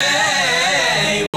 Key chant; Luxembourg Zwee

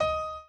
piano chord

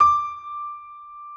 Key piano; Luxembourg Zwee

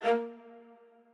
strings

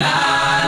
Key chant; Luxembourg Zwee

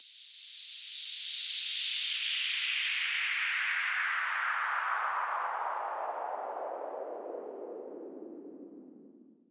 sweep

